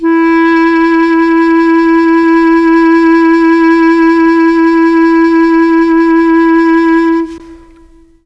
CLARINET E3, Mellotron; Mike Pinder - Mellotron Archive CD; CHM CLARINET

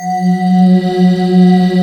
D70 mega pad; East West; Global Sounds

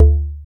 LOG, PERC; ETH. PERC

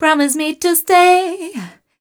f mel e flat, fem Vocals

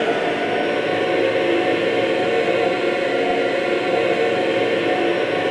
AVANTE G, Voices

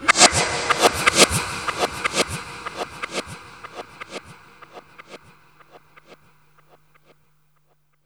REV ECHO; USB Soundscan vol.27

